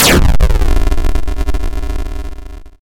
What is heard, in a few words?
Crush Sound; FNAF3